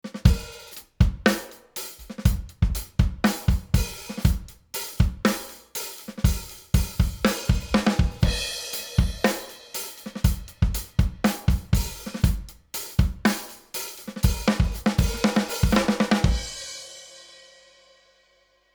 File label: ONE LA 500 Drums Medium Compressor; IGS Audio